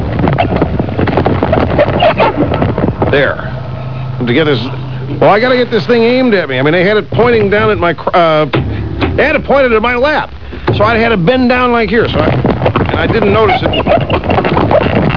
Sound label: micphone